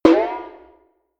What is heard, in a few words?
notification; samsung Aleart